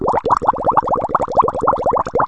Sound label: bubbles, Boiling and Bubbling